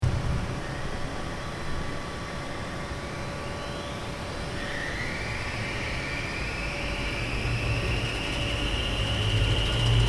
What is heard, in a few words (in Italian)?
Funicolare Lugano Cassarate Monte Brè; rumore lontano